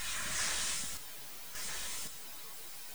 sauna

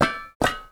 pipe

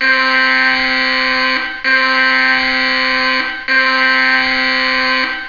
alarm

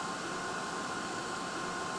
blowdryer

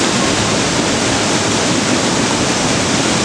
waterfall

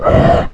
pain

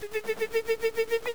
pipipi

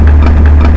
tank

